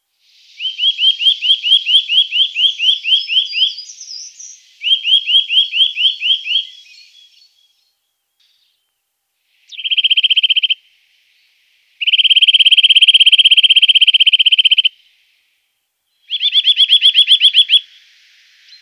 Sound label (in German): Kleiber, vogelstimmen; vogelstimmen-trainer